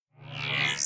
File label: bass f4